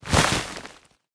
dirt; smite; god scylla

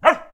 corgi bark; smite; god daji skin15

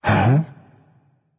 confused, berserker; cuchulainn

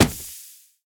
hit; smite; pele

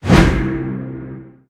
small swing; sobek; smite

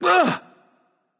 nv surprised, vox; smite; voicepack; vulcan

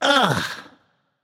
nv ugh; smite; voicepack; ganesha

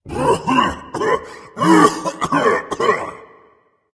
clear throat; smite; thanatos

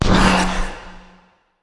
ghost from bag; smite; zhongkui

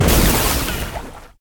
basic fire; Smite; Sol

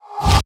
ghost bag; smite; zhongkui

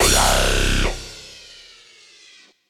Defeat Crash; smite; raijin

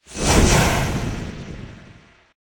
auto powerup; smite; guanyu

